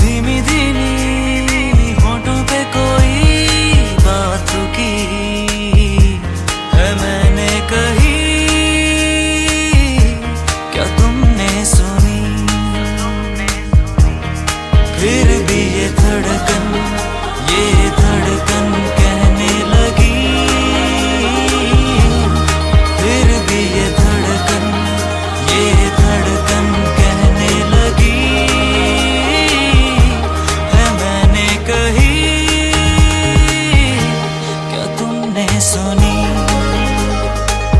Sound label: Romantic Hindi Song Ringtone